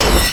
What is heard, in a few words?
hydro blast; nami base